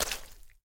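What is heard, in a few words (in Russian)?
шлепок сырой ногой по грязи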